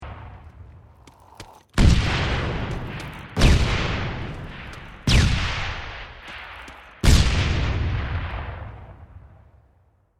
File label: Frag Grenade sound effect